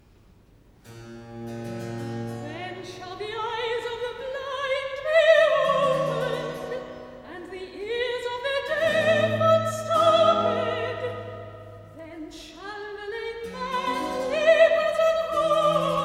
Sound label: Recitative-alto; Then shall the eyes of the blind; Handel Masterworks; Klassika